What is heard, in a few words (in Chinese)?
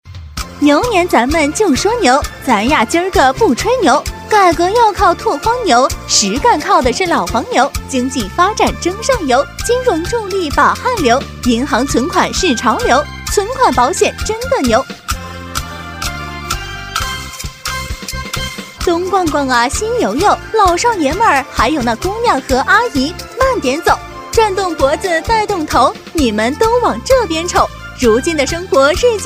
女-说唱快板 说牛年